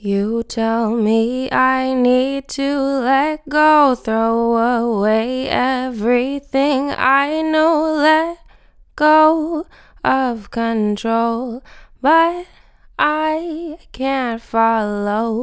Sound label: Acapella Bb Minor 120 BPM; Vocals; Ghosthack